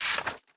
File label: bet Slip Paper Wiggle; netent